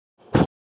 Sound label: Sub puff